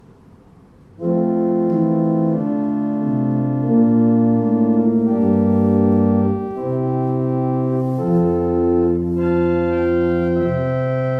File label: Osmond; Klangprobe Open Diapason 8' - M2